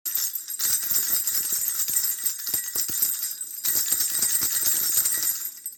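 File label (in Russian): pogremushka s bubenchikami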